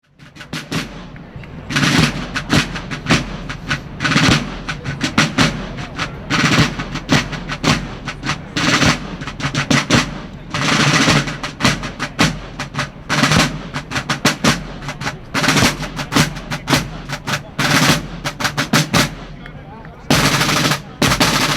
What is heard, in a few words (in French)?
paris la garde republicaine joue du tambour arc de triomphe